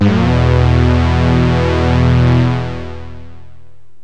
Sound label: bend 5th, yamaha rm1x synth bass and lead